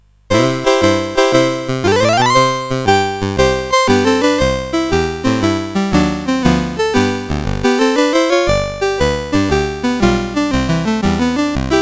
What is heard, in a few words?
CSG8580